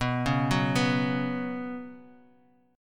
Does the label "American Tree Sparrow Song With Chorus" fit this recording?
No